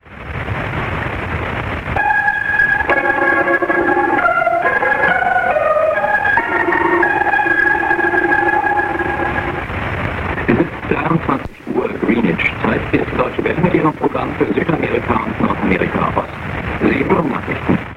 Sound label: 15275kHz